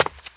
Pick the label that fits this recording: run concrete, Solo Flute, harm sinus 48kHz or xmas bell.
run concrete